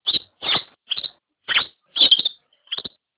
ringtone canary

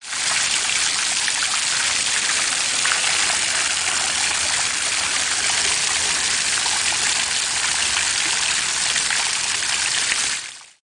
fountain, water

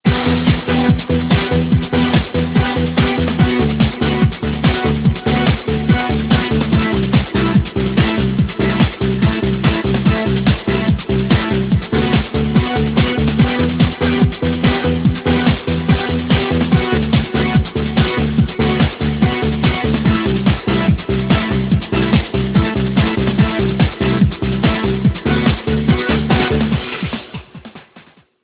ringtone russian techno

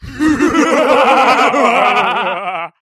madlaugh; human

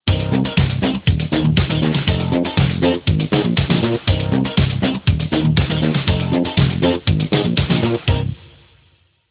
ringtone disco, instrumental

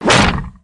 car door close; auto

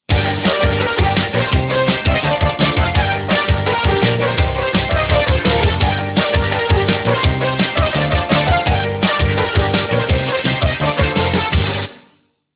ringtone pop rock

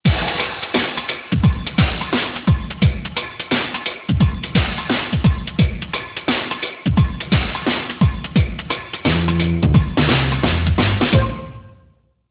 ringtone pop shuffle drum

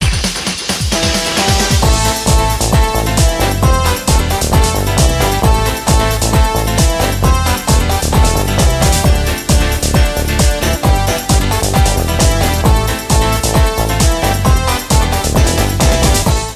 ringtone dance, instrumental

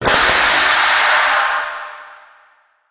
metal fall, industrial

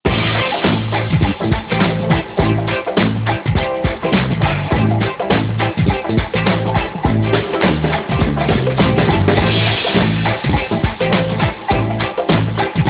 funk, instrumental

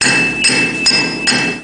hammer; industrial

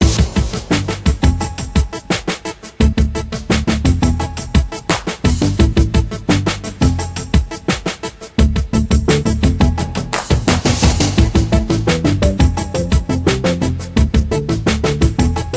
ringtone newage

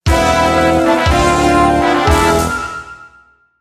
fanfare

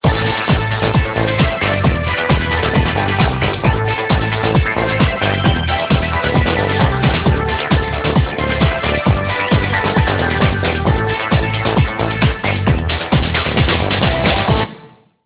ringtone dance, instrumental

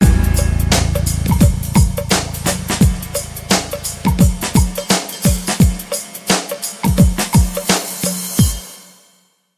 ringtone pop shuffle drum

